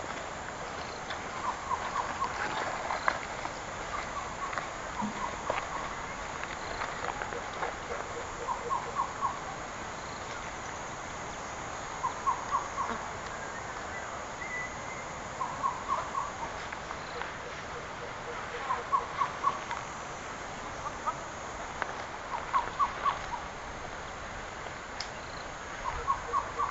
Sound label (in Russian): madagaskarskaya kukushka cuculus rochii, zvuki kukushki